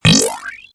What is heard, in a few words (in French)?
outil; MOBICLIC161